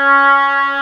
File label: Woodwinds; Sample CDs; Miroslav Vitous; Woodwind Brass Ensemble; Banks W&B